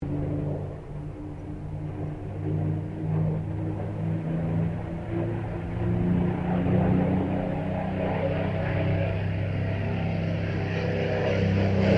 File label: AIRCRAFT SUPERMARINE SPITFIRE MK-VIII LOOP STEREO; Airplanes